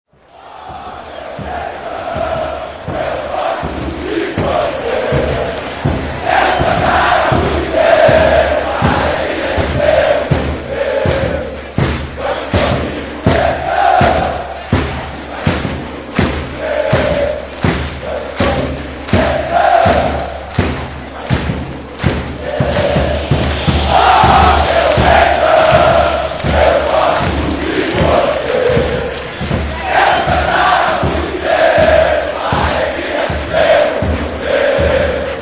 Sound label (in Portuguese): Grito Da Torcida Do Fla; Flamengo